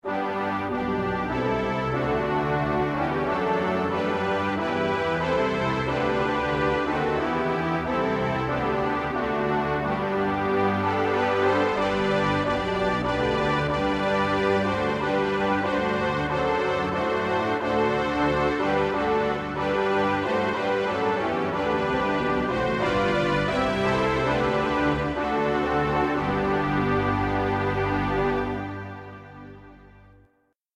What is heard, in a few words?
hymne UK